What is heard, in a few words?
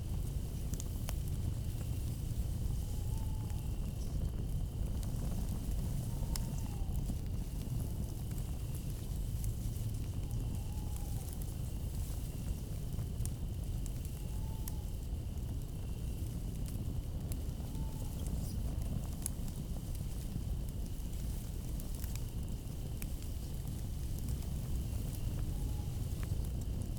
outdoor forest night campfire; anemoi